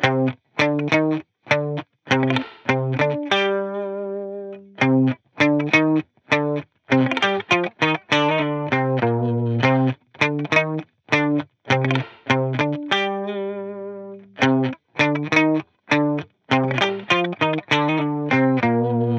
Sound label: guitar loop 100 Cm; Pop; Guitar Stacks; SD PASADENA Pop Sample Pack; Slate